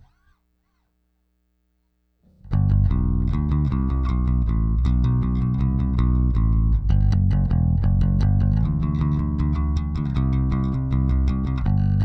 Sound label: Bass